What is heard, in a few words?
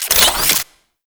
Jet Turbine Pistol Equip